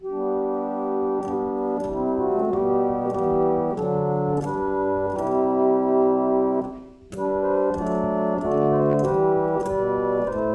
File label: Harmonium